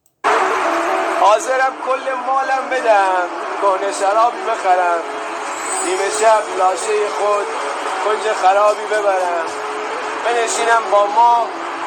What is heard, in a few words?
rap